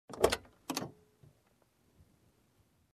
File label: Turning Key